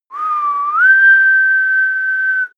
whistle call; koerpergeraeusche; menschen; soundpool

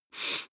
nose sniff male soft; koerpergeraeusche; menschen; soundpool

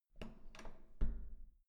door handle up soft

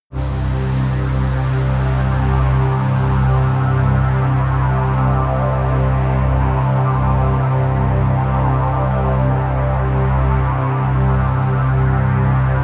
pad sweep normal; flaechen

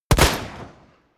pistol close shot; schusswaffen

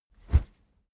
whoosh wood cornered slow; swooshes-swishes

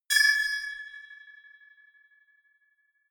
notification metal bing; kommunikation; signale

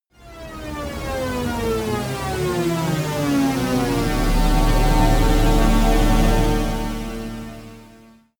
intro orchestra drop

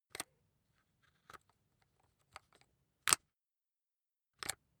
rock drill head attach soft; maschinen; bohrer; soundpool

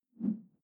whoosh simple short tonal low; swooshes-swishes

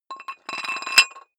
dumbbell thread put on weight slow, fitnessstudio

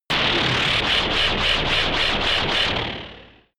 shot wobble noisy tension; pulsieren

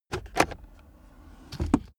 car accessories center console drawer open

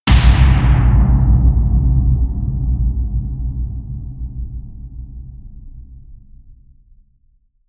fire boom

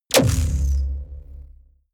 sci-fi sucking implosion; schusswaffen

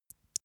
eye wink, comedy cartoon; koerpergeraeusche

